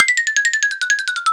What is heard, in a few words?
cake drop, Cake Release; Birthday Party Bash; Wii